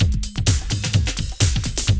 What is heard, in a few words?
D3 BREAK